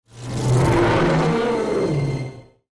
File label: Ice borer roar